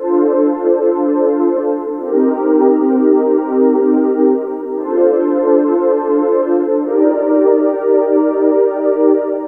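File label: PAD1 Left, FUNK; USB Soundscan vol.47; AKAI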